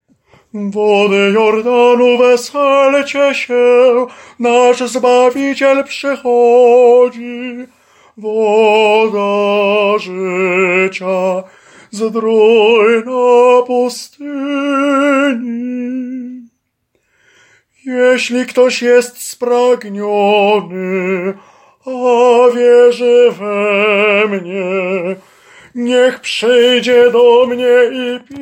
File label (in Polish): TENOR; Wody Jordanu